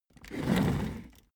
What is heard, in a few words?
office chair trundle fast